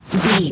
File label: footstep snow slide